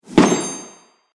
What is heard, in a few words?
Firework Magic Hit